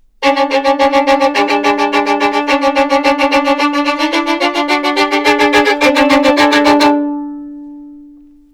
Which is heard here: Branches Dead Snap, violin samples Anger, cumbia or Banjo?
violin samples Anger